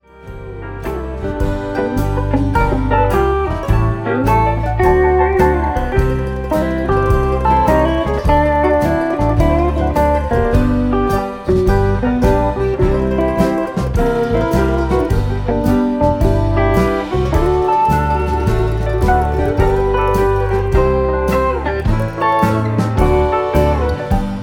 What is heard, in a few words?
lazy porch swing blues ringtone